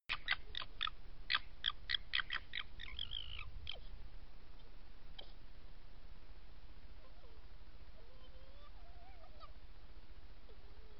verso coppia volpe vulpes renard roux rotfuchs zorro red fox